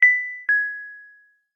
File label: notification Pure Bell; Samsung Gear S2; Alerts